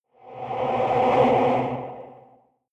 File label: evil choir transition, risers